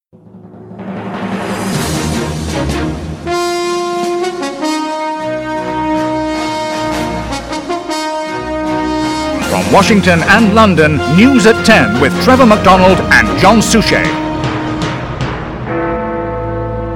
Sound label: News theme (with voiceover)